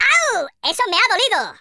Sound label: ouch; speech; Spanish